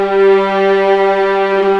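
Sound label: viola arco G3; East West; The Ultimate String Collection; VLAS ARCO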